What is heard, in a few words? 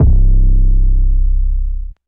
808 Trap, Bass; 808 Trap Selector Rack; Trap Drums by Sound Oracle; Ableton; Factory Packs